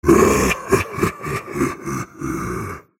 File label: Demonic Laughter